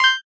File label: experience gained